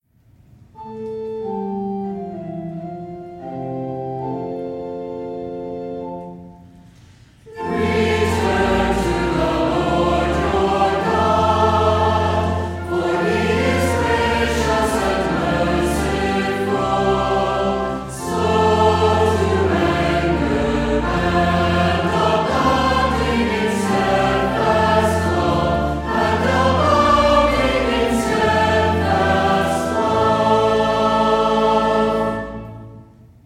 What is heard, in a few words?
Alleluia and Verse Lent; LSB 157